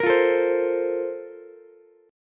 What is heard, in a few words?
Bb Major 7th Suspended 2nd, Ukulele; BbM7sus2 strummed; JGuitar